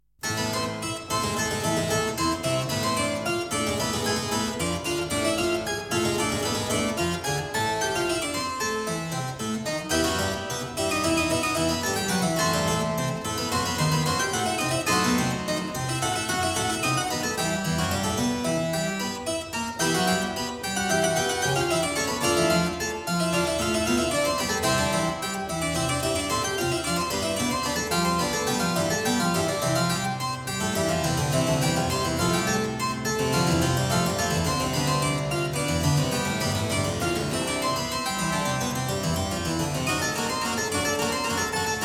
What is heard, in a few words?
Prelude in A minor, clavier; Prelude & Fugue No.20; BWV 865; BACH; WELL TEMPERED CLAVIER; BOOK I